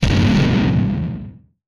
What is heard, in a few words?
Explosion; Space Blasters2D